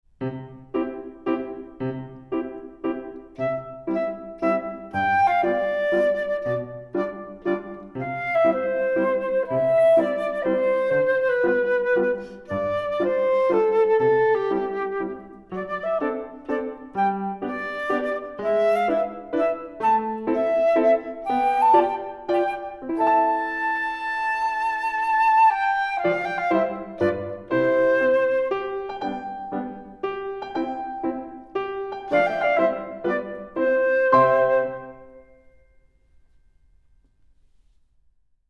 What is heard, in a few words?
performance Flute; Verdi; ABRSM